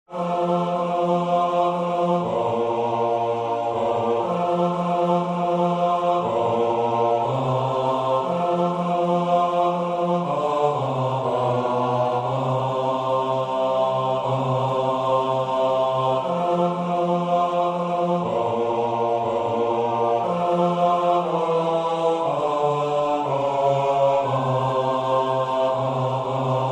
Nobody knows the trouble I've seen Bass

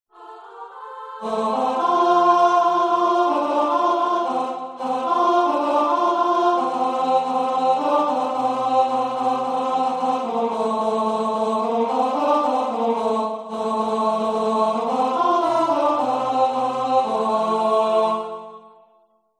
Chorus Tenor

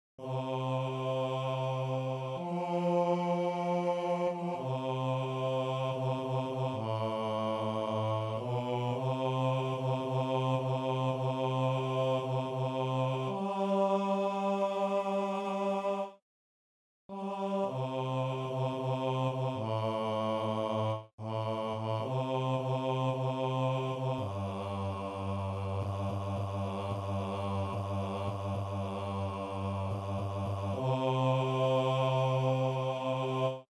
Bass

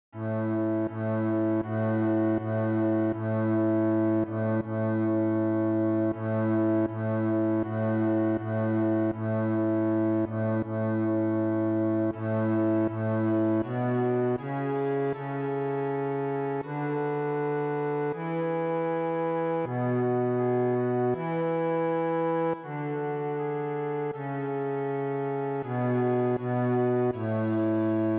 Bass

